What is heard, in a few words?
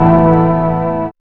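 synth chord; E-Lab; X Static Goldmine 1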